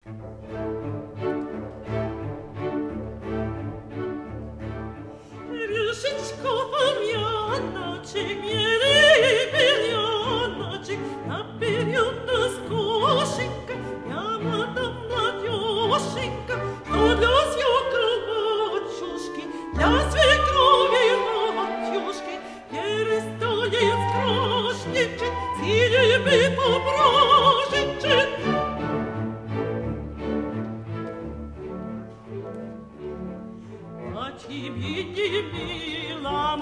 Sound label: Songs for mezzo soprano